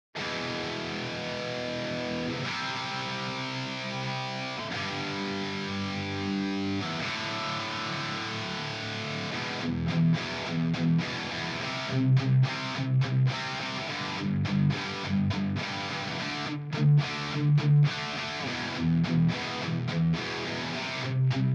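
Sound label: rock anthem rhythm guitar 105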